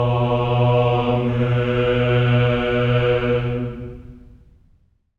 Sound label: amen; Spectrasonics; Symphony of Voices; GR AMENS